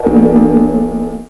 low gong

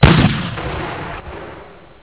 muzzle load shot

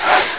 slice

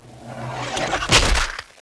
guillotine

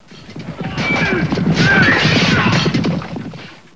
sword fight

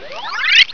grow

